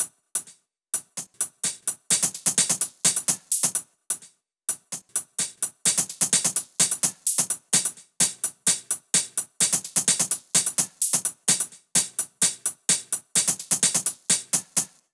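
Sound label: Drum Loop 128BPM hi-hat; VEC5 Drumloops; VEC5 Multi Loops 128BPM; Loop Elements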